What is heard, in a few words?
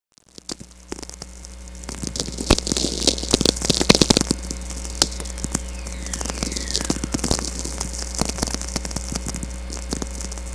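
whistler; Natural VLF Radio Emissions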